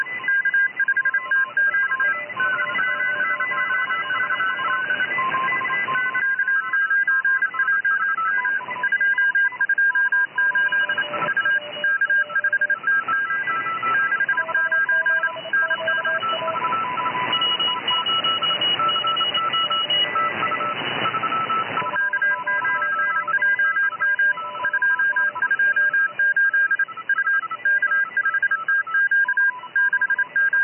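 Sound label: 40 Meter continuous wave, Morse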